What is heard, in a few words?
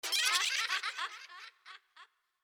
Laugh